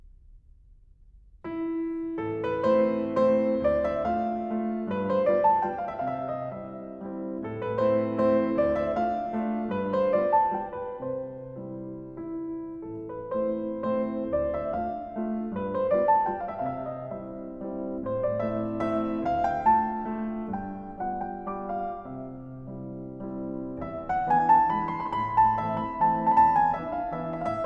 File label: Waltz for piano in A minor; Chopin Waltzes 4 Scherzos 26 Preludes